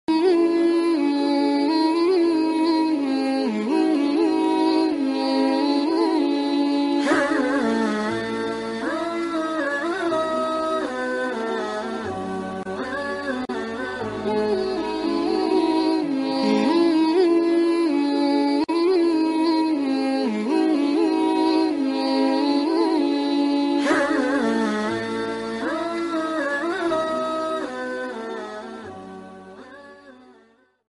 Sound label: arabic sad naat islamic